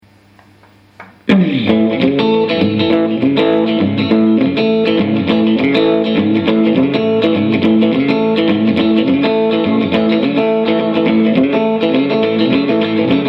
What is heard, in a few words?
Shuffle